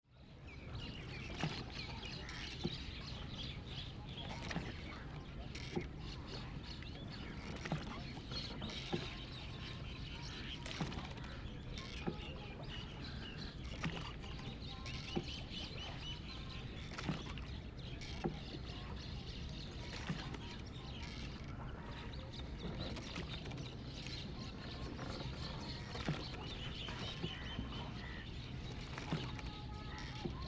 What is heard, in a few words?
Varanasi Sunrise Boatride